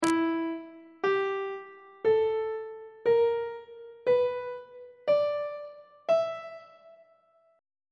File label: e blues scale on treble clef